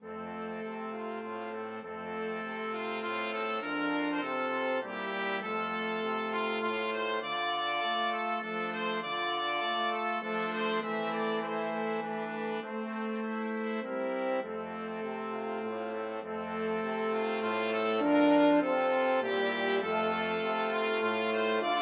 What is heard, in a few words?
brahms waltz, flexible brass ensemble 4 players